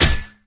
axe hit flesh, weapons

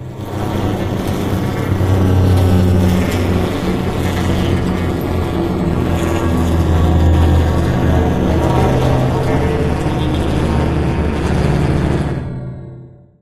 slow crusher close travel; Source Engine; Portal 2

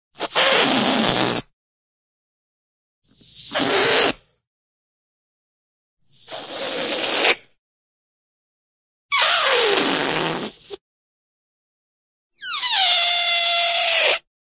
balloon balloon air squeak; cartoon

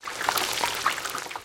toxic slime sizzle; ambient; canals; source engine; hl2; video games

